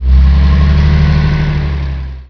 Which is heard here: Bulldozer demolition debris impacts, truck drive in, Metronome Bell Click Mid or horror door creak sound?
truck drive in